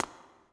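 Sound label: reverb run, footsteps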